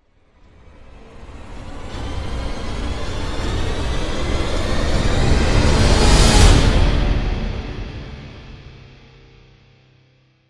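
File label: sting string build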